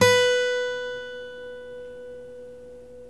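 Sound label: GTR B4 AC; Synclavier Sampler Library; KEYS AND GUITARS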